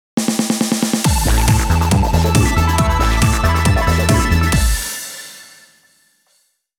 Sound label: Power Activation; Advance Wars 1+2 Re-Boot Camp